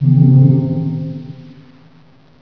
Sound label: HIT; Terra; TERACIDE